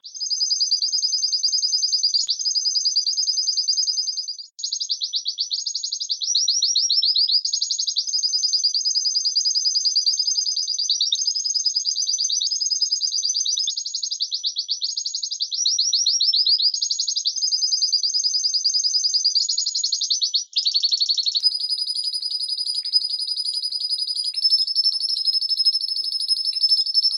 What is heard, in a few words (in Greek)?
κασκαμπέλεο, timbrado español; θετικές νότες